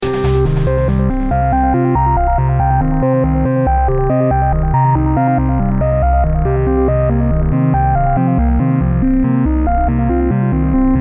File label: Music3; Space-Smash-Out; SoundManager Demo